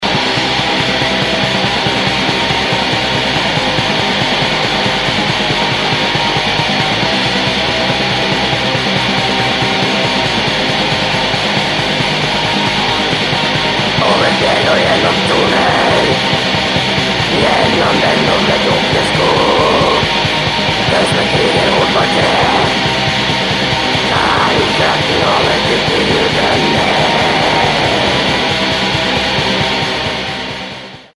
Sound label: Metal Black Metal Horror Black Metal